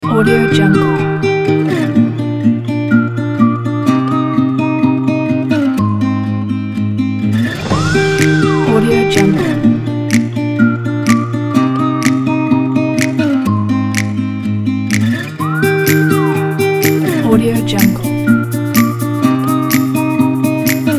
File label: autumn acoustic inspiration